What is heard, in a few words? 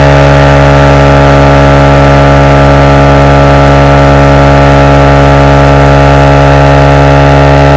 wankel large, engines; acf engines